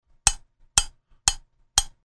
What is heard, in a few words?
coffeecup bpm120